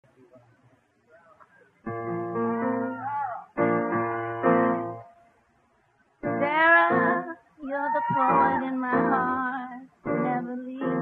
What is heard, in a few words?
storytellers piano